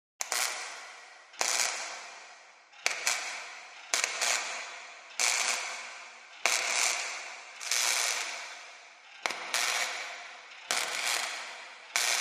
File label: Arc Welding Ambiance; arcs & sparks